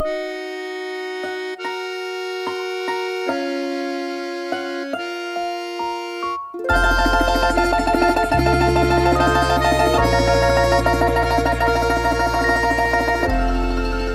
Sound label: instrumental